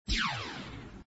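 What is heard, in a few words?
shoot Bullet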